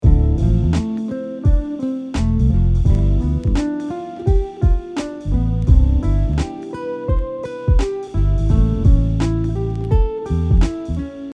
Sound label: Chord tone arpeggios ascending and descending, guitar; Chord Tone Arpeggios Ascending to descending pattern